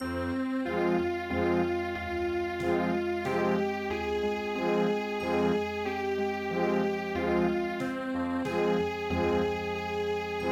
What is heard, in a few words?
winer waltz